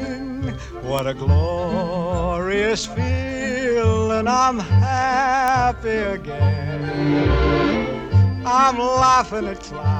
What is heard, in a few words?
sing click